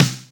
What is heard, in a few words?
Snares & Claps; Vengeance Samples